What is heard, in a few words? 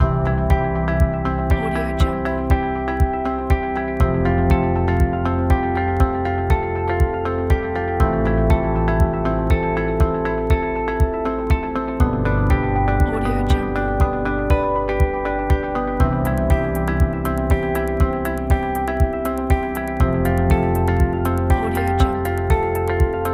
corporate minimalistic design